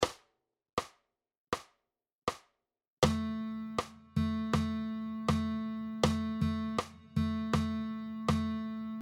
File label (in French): Dictées de rythme, guitare